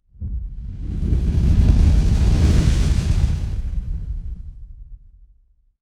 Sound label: fire electric whoosh flame